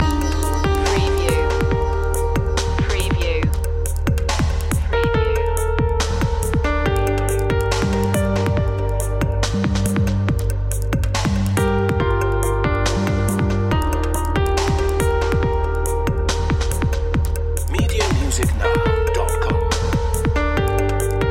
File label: tranquilizer loop